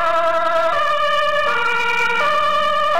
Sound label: Dutch Ambulance